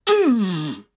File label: shield death